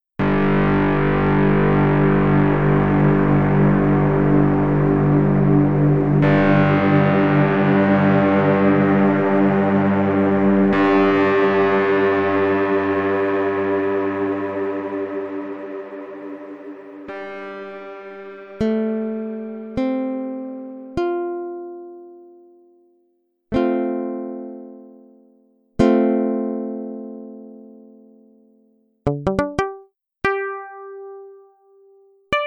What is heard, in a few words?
Fizz Pluck